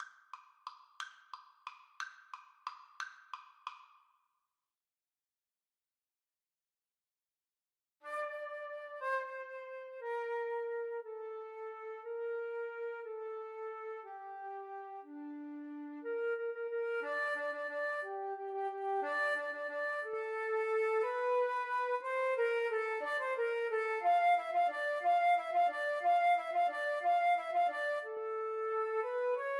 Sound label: flute duet